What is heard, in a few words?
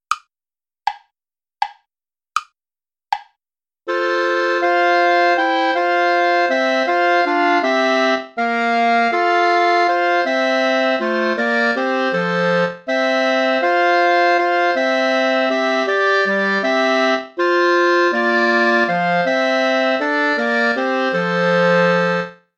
play along without clarinet; herr jesu christ dich uns wend